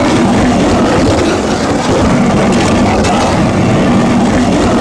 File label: slide wood railing, terrains; Tony Hawk's Project 8; XBOX